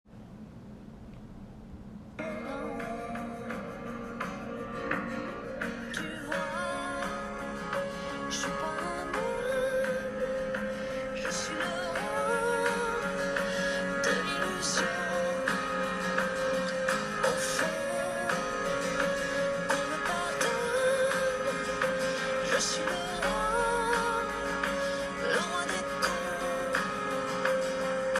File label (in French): voix haute, Voix Lead